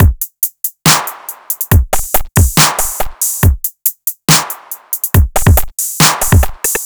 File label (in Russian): сэмпл ударных dubstep